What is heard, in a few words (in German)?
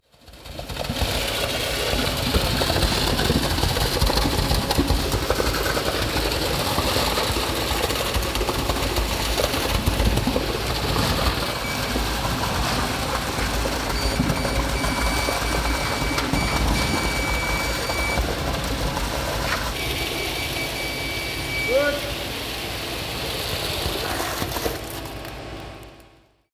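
Beton füllen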